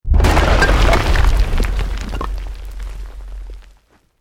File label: EXPLOSION MEDIUM STONE ROCK